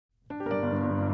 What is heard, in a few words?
Piano; c64; Monty on the Run